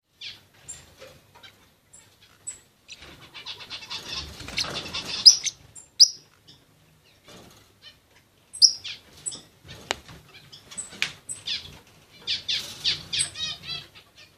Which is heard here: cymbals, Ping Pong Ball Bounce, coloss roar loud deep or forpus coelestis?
forpus coelestis